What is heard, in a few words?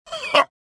deflect; Star Wars Jedi Knight II Jedi Outcast; XBOX; desann